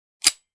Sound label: Empty gun trigger sound